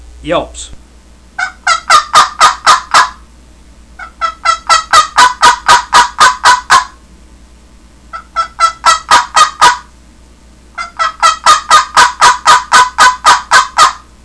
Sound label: cherry yelps, turkey; Super Yelper